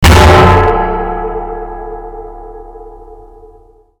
metal Jaw Debris; Timez Attack

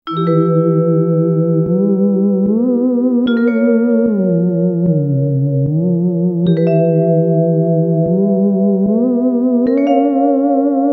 Theremin

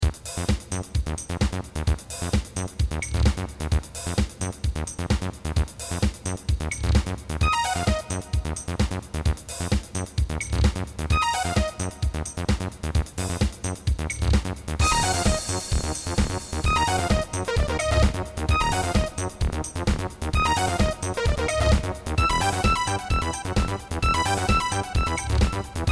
Techno

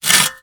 metal slide gravel; gamerip; shattered horizon 2009

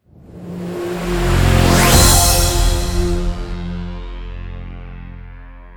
Transition; Everspace 2

